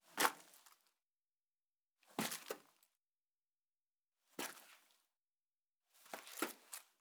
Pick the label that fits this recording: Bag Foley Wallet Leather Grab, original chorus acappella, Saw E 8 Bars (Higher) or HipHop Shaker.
Bag Foley Wallet Leather Grab